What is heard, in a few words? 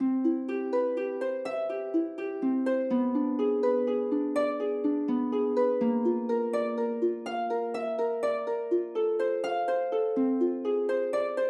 harp